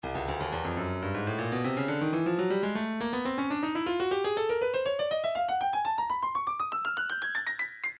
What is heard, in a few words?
breakbeat midi files 120 121 bpm 121 perc; Stylus Breakbeat Midi Files